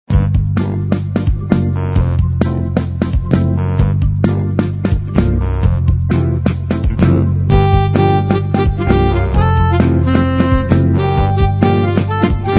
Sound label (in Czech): hudba; puffleparty